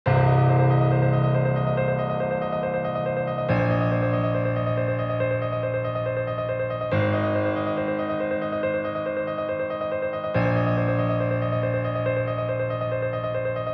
Countdown, Genre: Ambient, Background Music Instruments: Piano; Loopable; onemansymphony